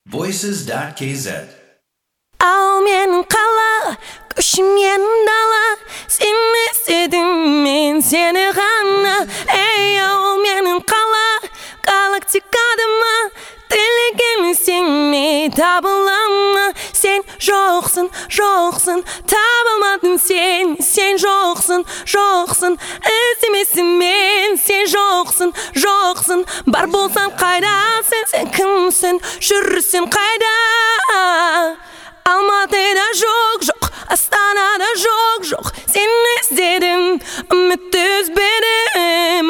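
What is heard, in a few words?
vocal Kazakh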